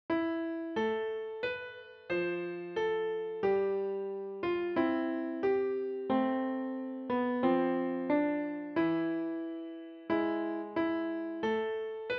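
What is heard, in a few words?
piano, für Klavier, leicht; Weihnachtslied für Klavier solo, leicht; Ich steh an deiner Krippe hier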